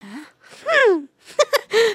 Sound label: pose, emotes